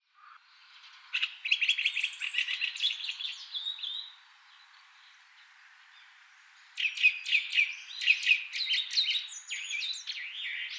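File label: komukudori, Chestnut-cheeked Starling; koe; muroran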